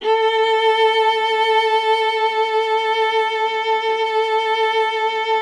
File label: STR VLA, Viola